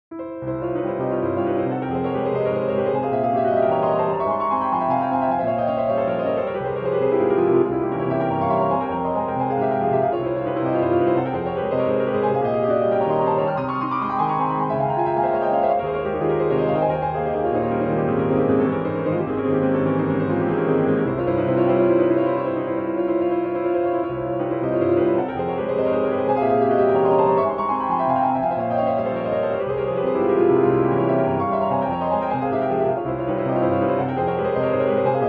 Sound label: 4 Hands Piano; Etudes, Op. 25 No. 2 in F Minor, 'the Bees'; Brahms